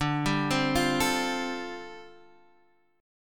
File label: G 7th Suspended 2nd Suspended 4th D chord